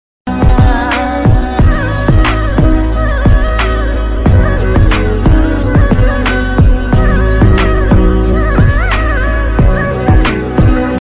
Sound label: nepali ringing tone, nepali song ringtone